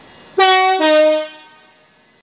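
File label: British Rail Class 375 horn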